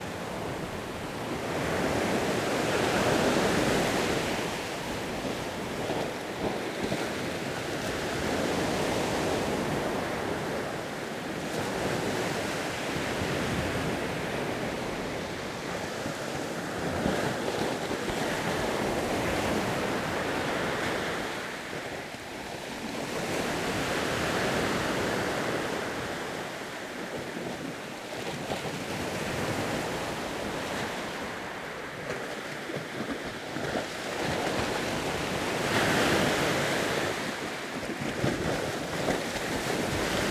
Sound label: Playa Forti; Rode NT 4; Curacao; Fieldrecordings